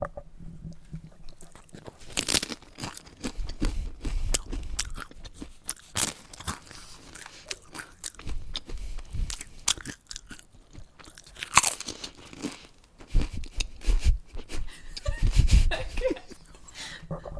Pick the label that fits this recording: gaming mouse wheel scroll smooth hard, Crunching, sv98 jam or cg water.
Crunching